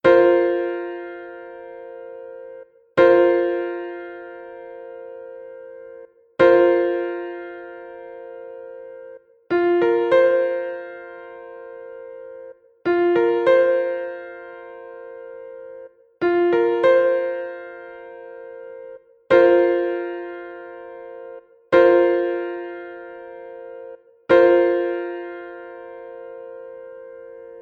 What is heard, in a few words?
Fsus4 Piano Chord